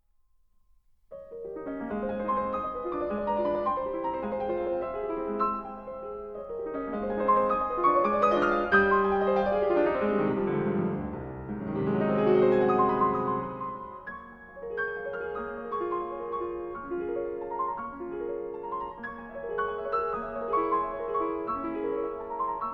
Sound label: piano; fortepian